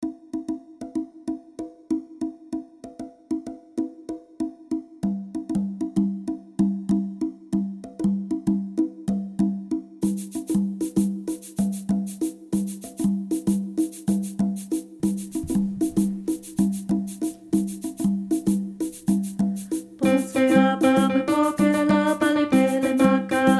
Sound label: Bonse Aba Alto; Rehearsal Recordings